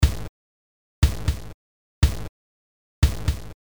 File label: dpcm